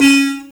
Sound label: BUZZ XYLO C#5; Spectrasonics; Heart of Africa 1